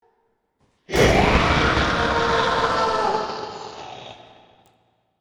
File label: Jump Scare